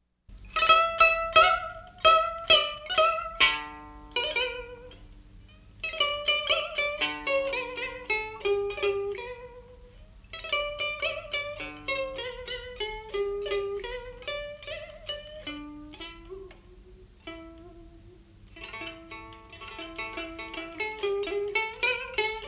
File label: Classical Quintet Ngu Tuyet Moon Lute Nguyet; Vietnam Music